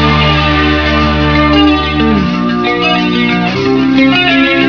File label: GUITAR; guitarras